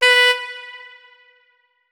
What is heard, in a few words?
notes, saxophone; Hideout Furniture